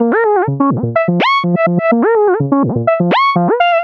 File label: Rambling Seqs, Synths; Sonic Foundry (Sony Creative Software); Underground UK House & Electro